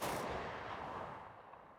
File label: m16a2; weapons